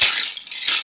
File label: Shaker; pitchglitch samples; Tombs